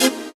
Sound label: Dance Mega Synth; Best Service; 90 s Sample CDs